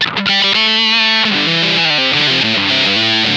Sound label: BIG, Funk Guitar; Zero G - Funk Guitar